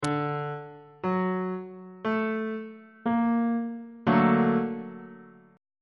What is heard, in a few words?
b flat augmented major 7th 1st inversion on bass clef